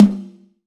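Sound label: Sharp High Tom, Roland BK-5 Toms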